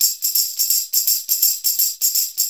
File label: Tamb; Soundscan vol.56; Modern Percussion Loops; AKAI